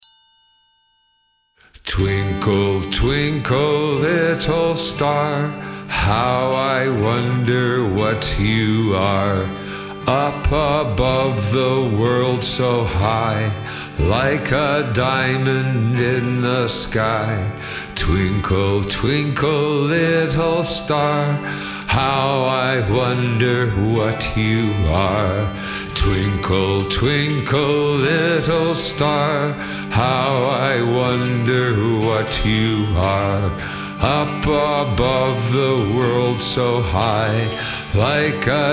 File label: Twinkle Twinkle Baa Baa